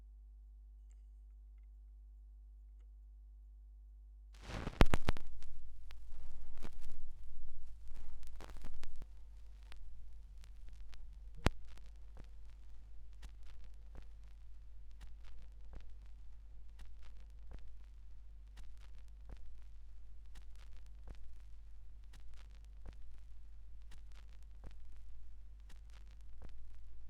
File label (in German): schallplattengeraeusche